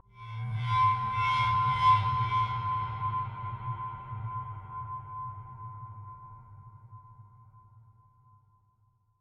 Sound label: cave, ambient; minecraft; Votive World